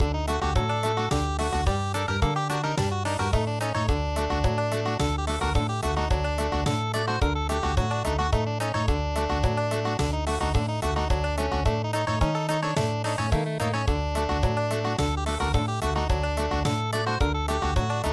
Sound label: Country, traditional